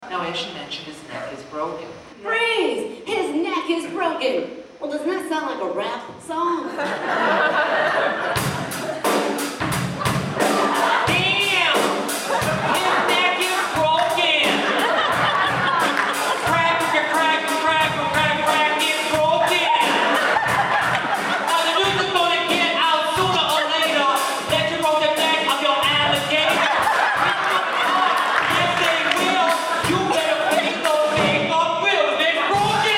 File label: Rap